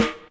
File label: FX rim, Electric Snare, Yamaha DTXpress